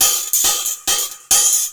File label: percussion loops, 138, Techno